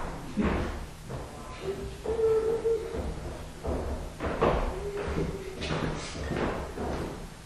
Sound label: child crying